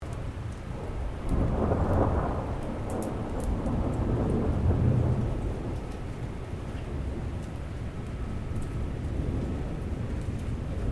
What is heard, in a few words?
Thunder Medium Light Rain; Weather